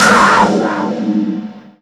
COUGAR SD; USB Soundscan vol.33; Disjointed Textures; AKAI

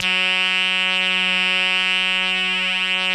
SQUEEK, LEADS; Masterbits; Vintage Voltage

